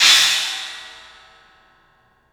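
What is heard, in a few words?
CLUB CYMBALS; 3D Sound; 3D Drums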